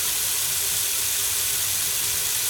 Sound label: faucet on